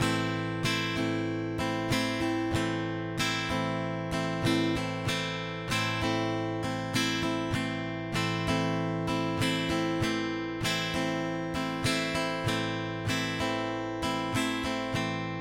bicie 4/4 w wolniejszym tempie

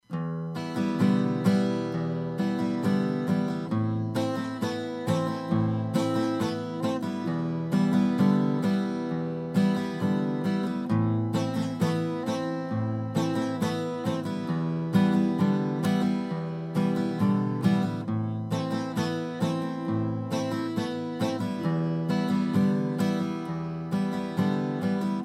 zwrotka kostka, gitara

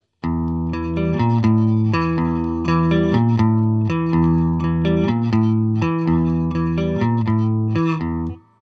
riff, gitara

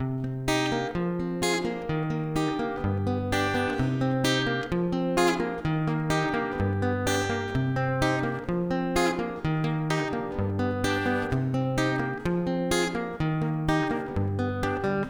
zwrotka, gitara

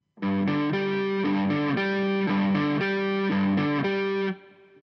riff akompaniament power chord G

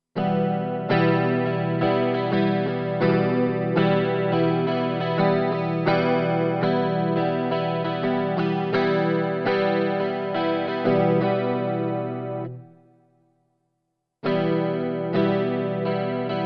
bicie szesnastkowe

